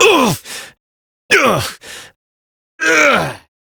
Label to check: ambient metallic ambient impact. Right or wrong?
Wrong